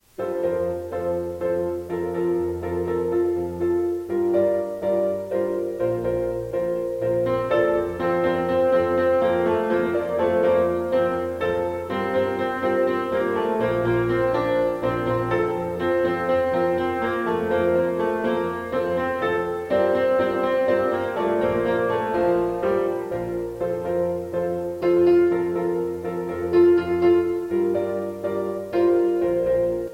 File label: Piano